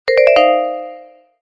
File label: Vibes (short), ringtones